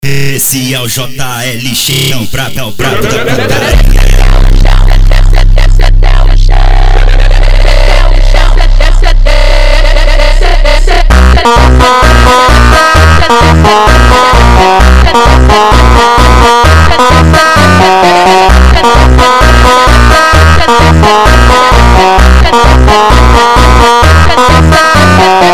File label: Phonk MONTAGEM